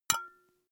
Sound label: glass metal small impact damped